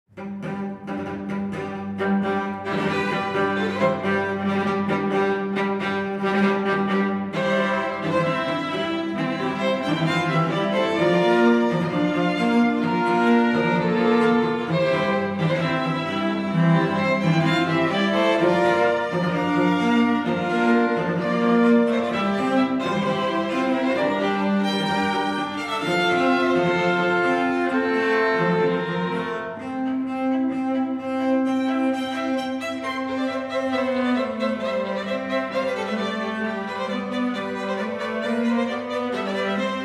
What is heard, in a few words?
Game Music w rev